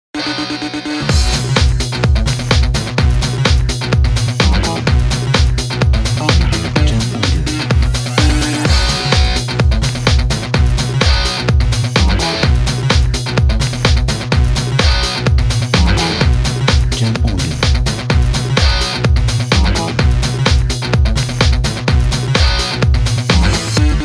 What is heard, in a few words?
Energetic Sport